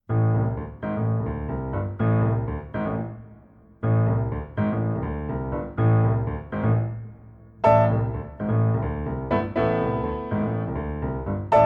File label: Roll Of Thunder